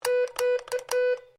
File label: MORSE CODE Q; Morse code aplphabete; Morse Code (Sound Effects:Electronics)